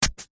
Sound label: household garden wooden sharp gardening tool stabs into grass soil single